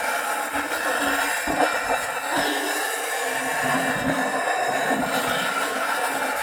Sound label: Sheet Metal Mixdown